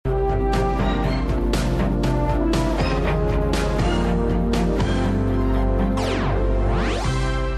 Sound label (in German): fazit jingle; tele5; tv total